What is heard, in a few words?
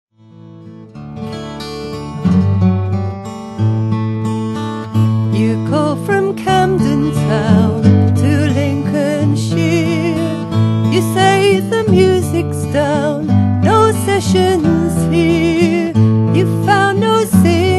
Singing, goat music